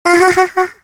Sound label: turret disabled; freak fortress 2; chell